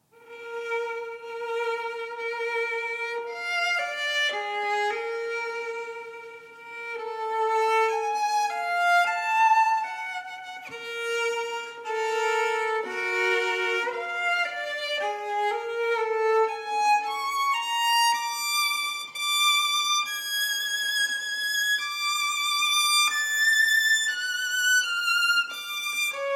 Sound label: partita for solo violin intermezzo